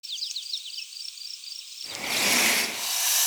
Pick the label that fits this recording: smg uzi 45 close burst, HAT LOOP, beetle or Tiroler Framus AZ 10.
beetle